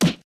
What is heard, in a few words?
Punch; SSB